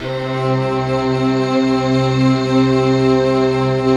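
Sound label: Japanese flute, Short Japanese Flute; Optical Media International; Sonic Images Library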